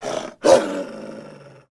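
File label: angry growl of a young frisky wolf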